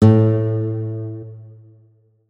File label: notes, guitar; Hideout Furniture